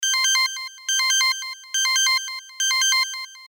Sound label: piropiro